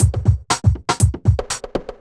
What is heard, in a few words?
chemical 120, loops, 120bpm